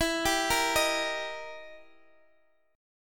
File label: E Minor Major 7th Flat 5th, Guitar, Open D; chord shape